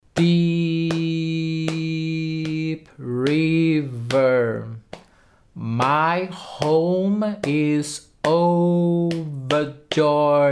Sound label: soprano; deep river